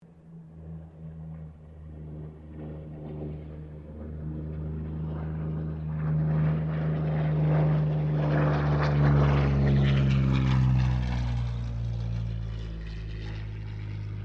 AIRCRAFT P-40 KITTYHAWK PASS STEREO; P-40 Kittyhawk / Warhawk / Tomahawk; Airplanes

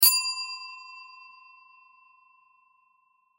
Bell Desk Hand; Foley; Bells; Blastwave

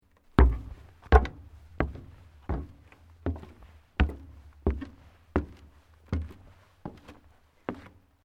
Footsteps Sneakers Steps Wooden Ascend Sequence; Footstep Foley; Human

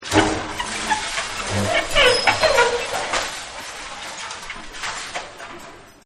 Screaming In Pain; Scrapes; Objects

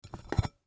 Plate Pick Up Wood, Plate Pick Up Wood Table Dish; Dishware; Household; Blastwave

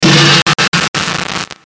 Hit Distortion, Hit Distortion Stutter; Blastwave

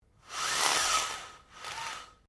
Blinds Venetian Raise Quickly, Household blinds; Living Room (Sound Effects:Household)

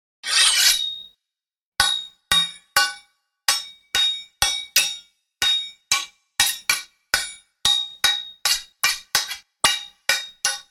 Sword Fight, Clashing of swords in a duel; Combat

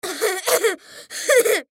Human Cough; Cough Clear Throat Male Ten Yrs Boy; Human Cough Clear Throat Male Ten Year Old Boy; Sick; Sound Effects:Human

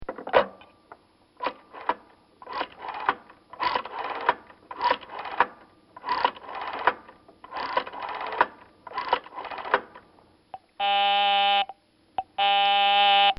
1920S Phone Dialing With Busy Signal; Rings; Bobby Cole

